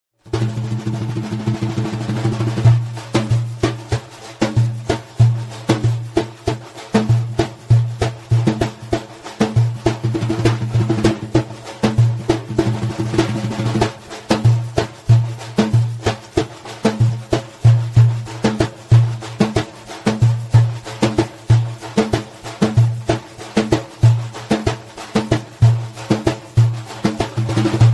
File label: Solo Alternate Version